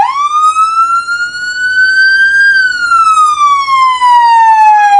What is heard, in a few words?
wail, sirens; whelen 295hsfa6